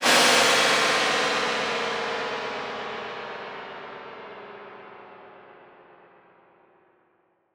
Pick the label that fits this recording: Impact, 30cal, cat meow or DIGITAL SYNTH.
Impact